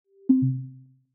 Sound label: attending window end; iOS 26 Sounds